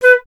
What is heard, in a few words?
flute B; Miroslav Vitous - Solo Instruments VOL-2; Solo lnst. II